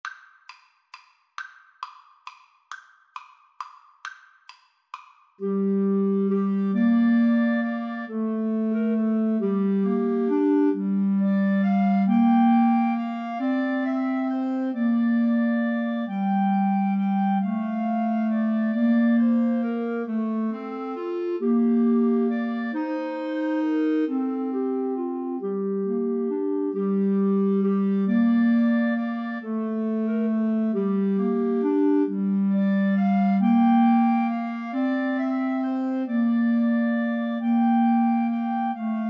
Clarinet Trio

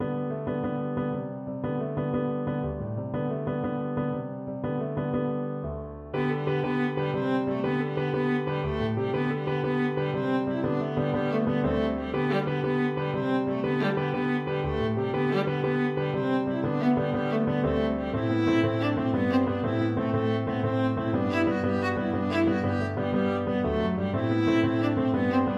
viola

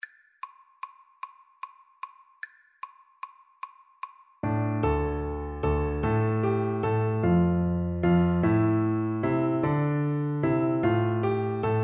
babe of bethlehem, Trombone

